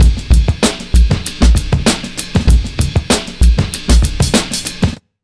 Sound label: 97 BEAT, Underground Hip Hop; USB Soundscan vol.02; AKAI